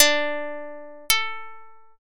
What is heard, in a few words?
sitar